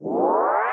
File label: find opponent search music windup; gamerip; Hearthstone